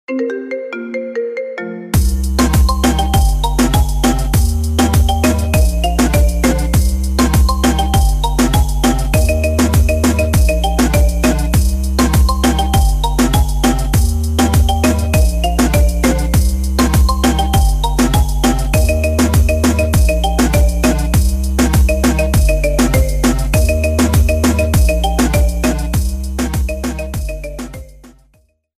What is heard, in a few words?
marimba remix ringtone